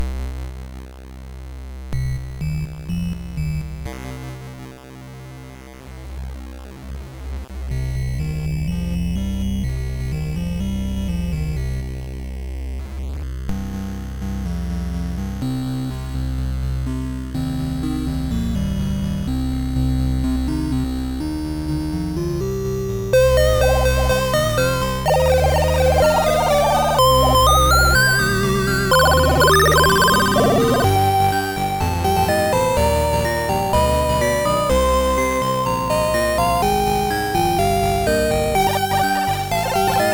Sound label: med synth; OctaMED MED